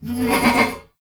Ram Idle Grunt; Warframe; Duviri; Animals

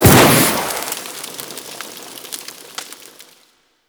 Fire Damage Hit; Warframe

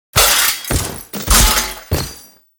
riposte polearm finisher foley; Enemies; Warframe; Duviri; Dax

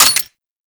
Grineer Lever Action Rifle Mech; Weapons

